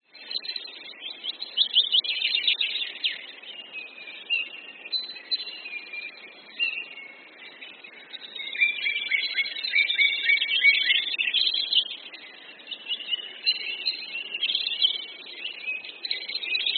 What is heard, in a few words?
Morning Birds Chirping